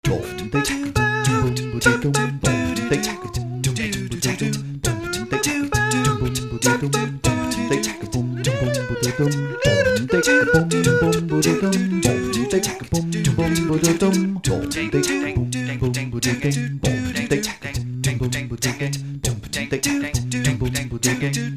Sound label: A capella jazz funk